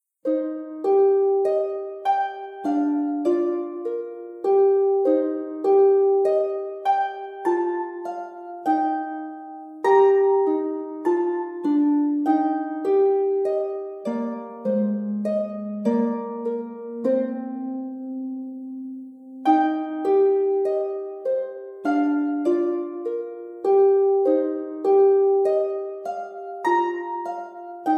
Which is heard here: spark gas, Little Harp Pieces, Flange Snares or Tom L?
Little Harp Pieces